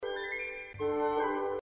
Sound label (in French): papapa, la flute enchantee